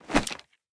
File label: punch gun miss; gmod; arccw uc commons attachments